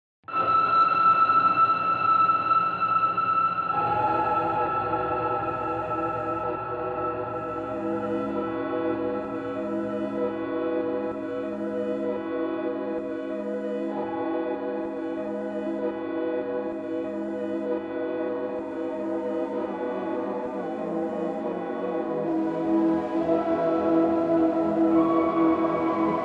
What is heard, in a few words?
Ambience; Documentaries; Original Soundtrack; A Brony Tale